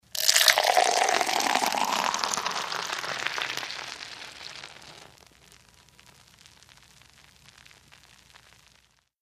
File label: Beer Pour Classic; eating & drinking